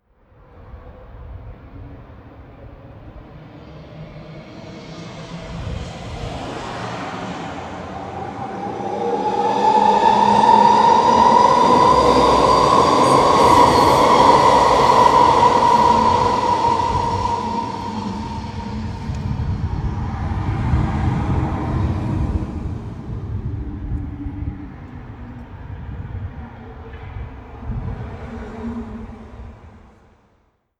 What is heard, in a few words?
Vancouver Noise mono shotgun, traffic